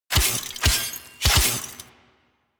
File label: Sentient Death By Sword Hits; The New War; Lua Valley Ext